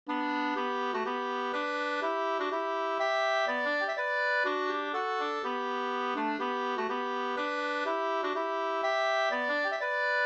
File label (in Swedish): polska, 2st; E Hartwig u Jansas Arne Eriksson Gs